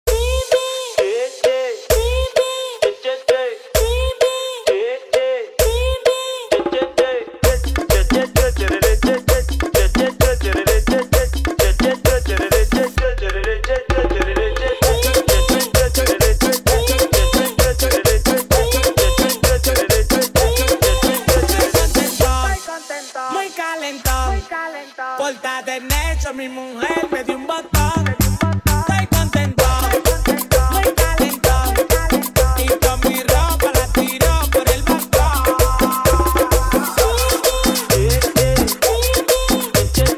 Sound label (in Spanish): guaracha version 130 BPM